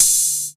hot open hi hat sound A# key, open hat tuned to the A# note